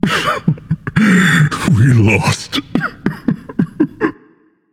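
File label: non-verbal boohoo; smite; atlas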